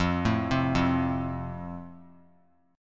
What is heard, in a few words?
F Major, F Chord; arpeggiated